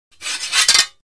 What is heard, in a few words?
Swords Metal Shing; clangs